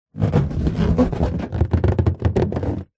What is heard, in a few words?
balloon inflated handling creak; foley